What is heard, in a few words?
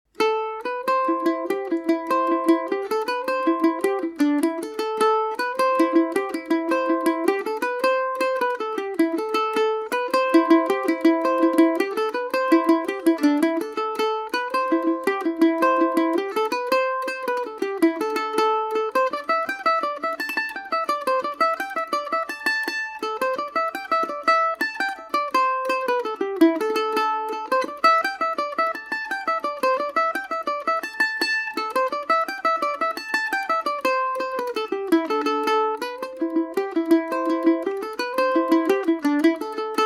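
A Dorian, Irish mandolin; geese in the bog; Paris Swing Macaferri mandolin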